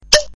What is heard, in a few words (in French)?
sms bouchon champagne